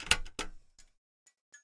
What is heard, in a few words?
lighting on